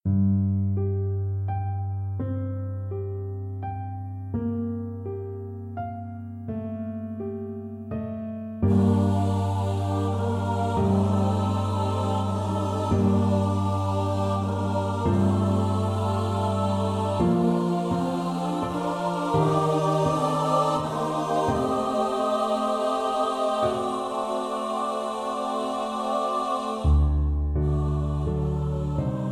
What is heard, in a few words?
Khatzi Kaddish avec piano